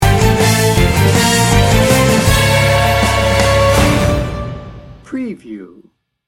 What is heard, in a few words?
heroic adventure sting bumper